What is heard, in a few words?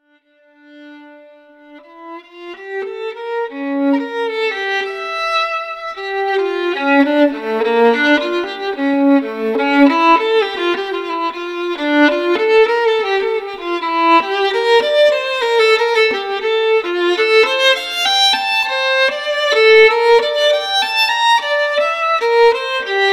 Solo Violin; se electronics vr2; میکروفون استودیویی و حرفه ای اس ای الکترونیکس VR2